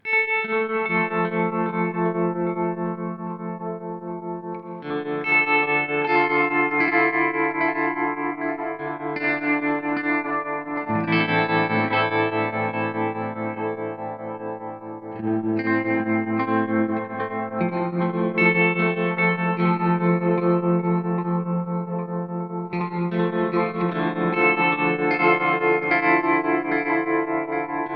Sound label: Duophony Y X LFO; GFI System